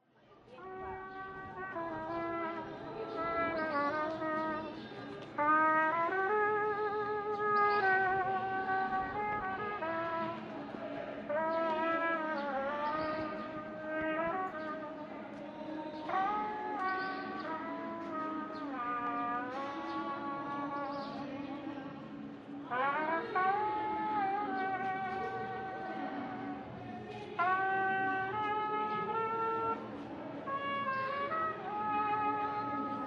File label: Trumpet Player Old Havana Cuba